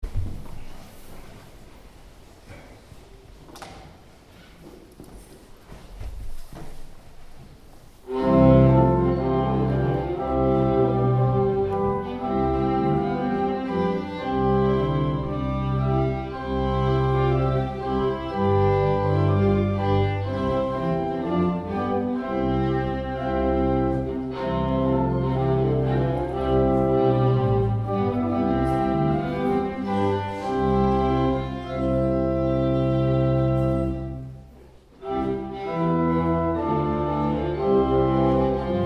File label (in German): Irische Volksweise, Orgel, Bratsche